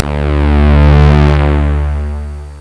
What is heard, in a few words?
horn